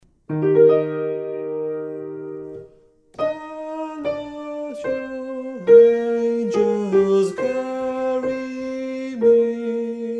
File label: Chorale Soprano